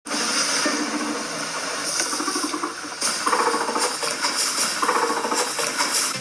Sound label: Purring, guinea pigs; happy guinea pigs